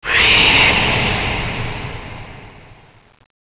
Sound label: zombie change; zmall